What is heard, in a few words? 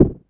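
snare drum, Drum & Bass; Ueberschall; Drum & Bass Resonance; 168 BPM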